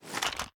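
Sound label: assault rifle reload fast extended magazine rattle; gmod; viper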